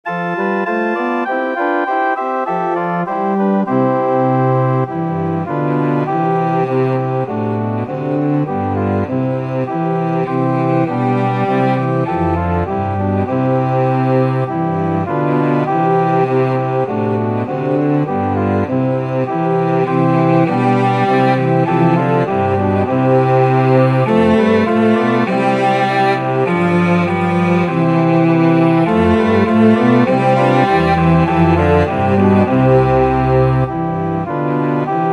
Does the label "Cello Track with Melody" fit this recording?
Yes